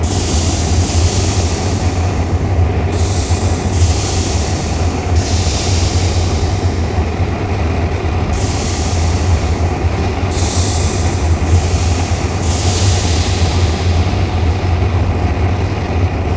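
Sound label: deathmaze loop, ambient soundscapes; dota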